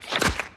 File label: face attack appear; gurdy